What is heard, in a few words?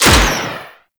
Missile Shot; Warframe; Weapons; Archwing; Powers